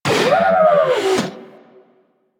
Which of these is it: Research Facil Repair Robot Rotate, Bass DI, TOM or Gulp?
Research Facil Repair Robot Rotate